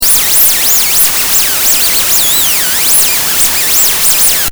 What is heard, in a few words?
noises